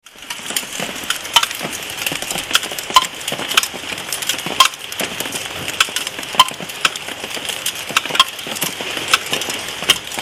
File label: koenji rain; field recordings; slater